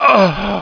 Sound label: death, player; xash3d fwgs; dmc